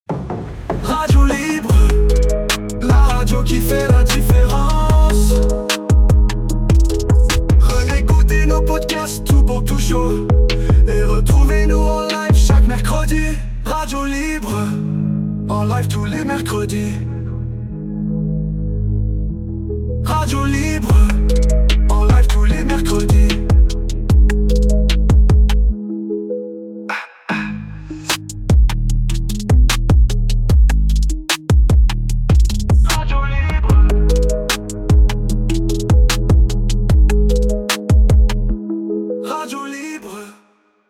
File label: Jingles Radio Chantes smoth Trap Rap; short; Radio Libre